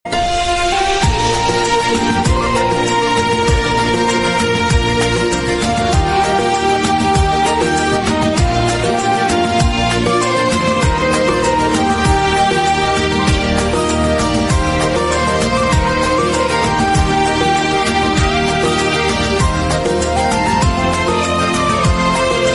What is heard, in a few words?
flute; Kasauti Zindagi Ki 2; tv serial